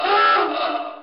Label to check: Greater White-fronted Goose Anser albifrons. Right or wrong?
Wrong